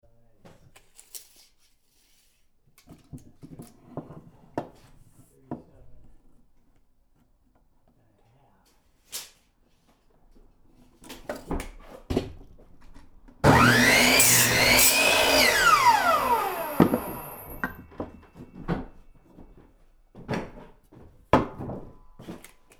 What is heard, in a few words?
Saw Sounds, Hammering Sounds